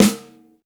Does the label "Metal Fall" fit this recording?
No